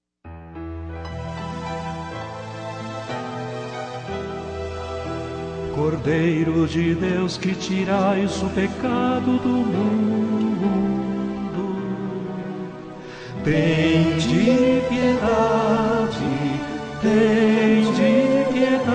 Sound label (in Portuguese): Cordeiro de Deus, cantos